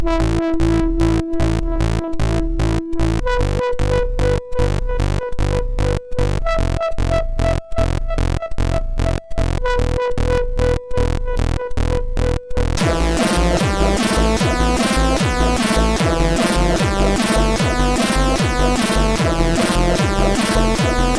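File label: On a Move, MOS 6581 SID chip music, games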